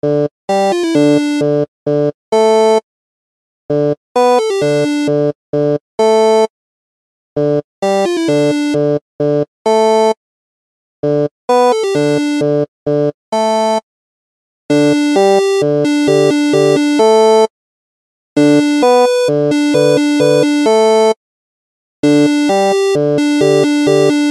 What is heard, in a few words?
Intro Loop Music; Pixboy; android ios macos mobile switch windows